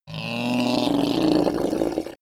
reptile choke angry; kreaturen

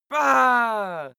male attack, schreie; menschen

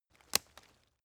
step leaves branch crack short; schritte

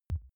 click bottom short, signale; kommunikation

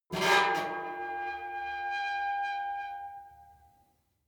metal squeal saturated

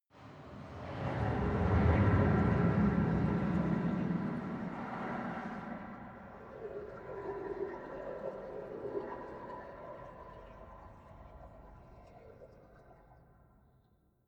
drowning calls, horror

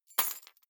key ring large drop parquet soft; objekte; schluessel

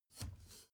step ladder wood grip single; schritte

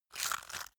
stone scrape short hard; natur-tiere; steine

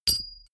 bullet drop metal

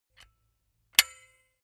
silicone gun bullet lock mechanism heavy; soundpool

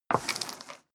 step leather shoes wood parquet slide long; schritte

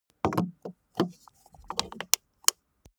car bmw e46 exterior gas cap spin close

